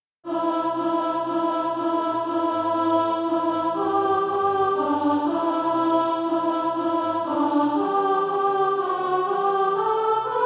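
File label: soprano, Practice Music; A Cry as of pain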